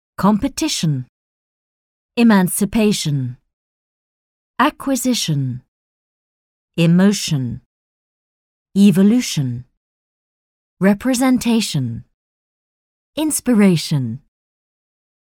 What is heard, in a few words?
techno